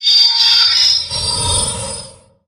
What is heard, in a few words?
sphere socket attach; Source Engine; Portal 2